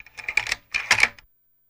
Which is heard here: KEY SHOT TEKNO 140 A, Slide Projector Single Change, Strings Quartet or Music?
Slide Projector Single Change